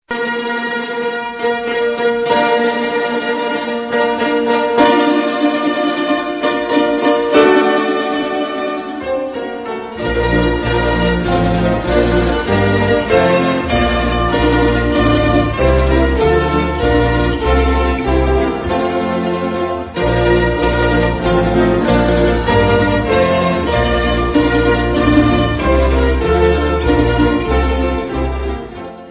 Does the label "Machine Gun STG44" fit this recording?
No